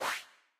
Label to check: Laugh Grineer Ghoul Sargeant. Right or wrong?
Wrong